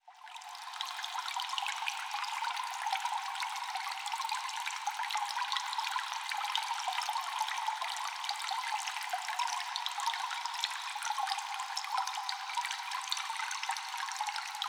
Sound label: water cave ambience water drips